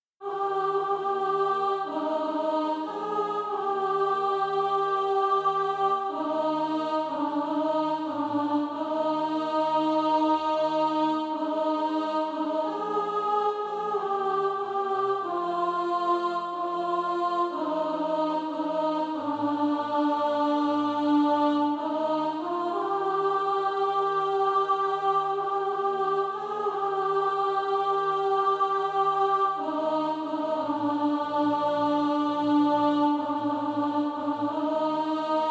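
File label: alto, SATB Practise Music; Christ the Lord Is King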